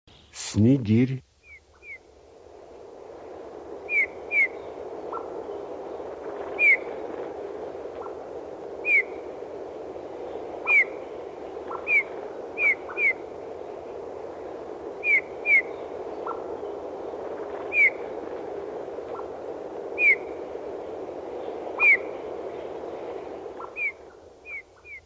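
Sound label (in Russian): snigir, голоса птиц; Снегирь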